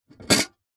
household teapot metal old pick up from hard surface